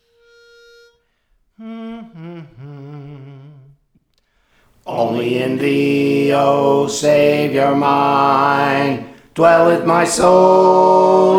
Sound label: Alto; Praise For The Lord Training